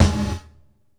Gated Snare; Univerb; creative gate; Gated Reverb

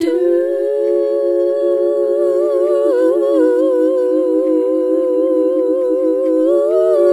Female Group, Hip-Hop and RnB Vocals

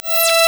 NOISE

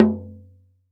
CHANGGO L, KOREAN PERCUSSION; AKAI S6000 CD-ROM - Volume 5

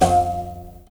MOUTH BOW LO, Heart of Africa

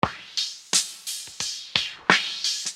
Filter Groove; Construction Kit; HIP-HOP; Sonic Foundry (Sony Creative Software)

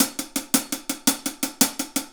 Hi Hat Pattern

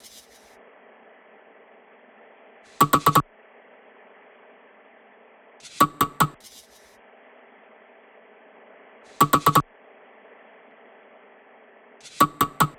loop perc 150; Seismic Loops; SEISMIC2

